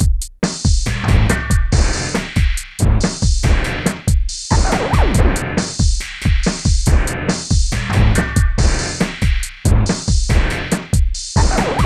PHATT BEA; E-MU Formula 4000 Series Vol. 1; Hip Hop Nation

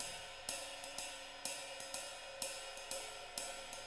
SWING; Sampleheads; Peter Erskine Living Drums

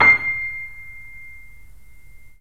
3D Pianos Yamaha C7; E-MU Producer Series Vol. 5

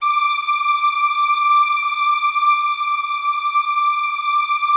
D5, STRINGS; Yamaha VP-1; Music Store; JODYSTRINGS

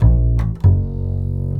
BASS, Drum & Bass, 150 BPM

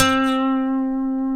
D, funk guitars

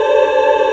Vox Wave 5 D4; Digital Sound Factory Xtreme Lead

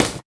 Foley Step Snare; essential drumkit

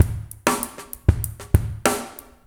PRP DR WET, Drums; Spectrasonics; Liquid Grooves 1 & 2

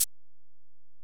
EPerc, Alesis DM-PRO

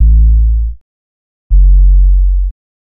Bass, Futurist Drum & Bass

